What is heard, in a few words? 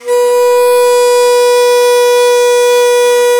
BON DI, Winds, Old World Instruments